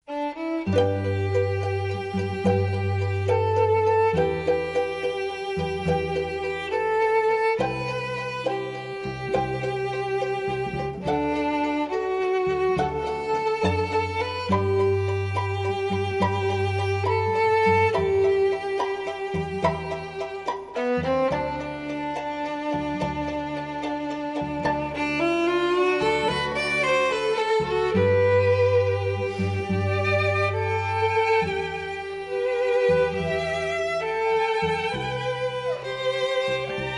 carneval strings

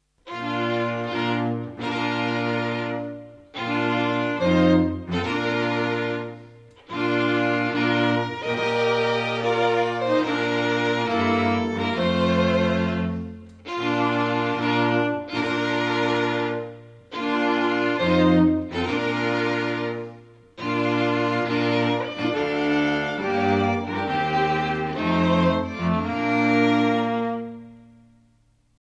strings bridal chorus; carneval

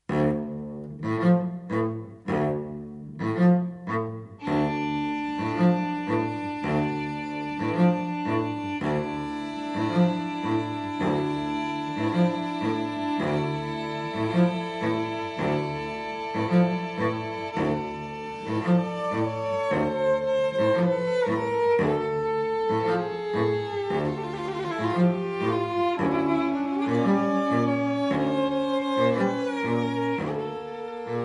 carneval strings habanera

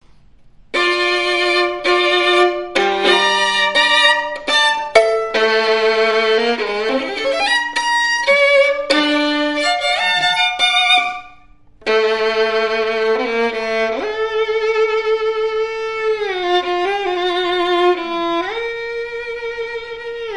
strings csardas; carneval

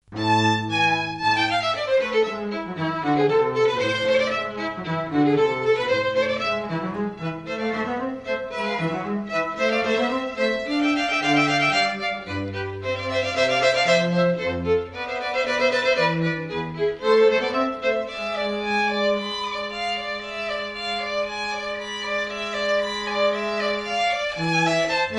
carneval strings a minor

